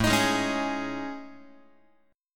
A Major 7th Flat 5th, Guitar; AM7b5; strummed; x,5,6,6,5,7

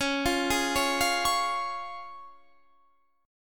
C sharp Major, Guitar Standard; arpeggiated; 21,20,18,18,18,21; JGuitar

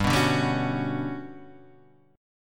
G Major Sharp 11th G down, chord; strummed; Standard; JGuitar

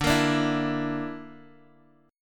D Suspended 2nd Sharp 5th D, guitar; x,x,0,3,3,0; strummed

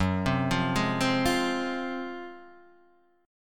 F Minor F sharp, Fm/F# chord; arpeggiated; JGuitar

